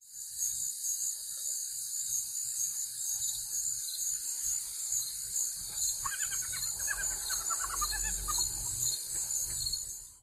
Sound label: noise in the dark